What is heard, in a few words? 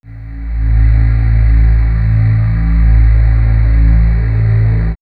Swirls Underwater, pads; X-Static Goldmine